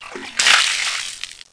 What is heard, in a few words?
melt; GEOGRAFIA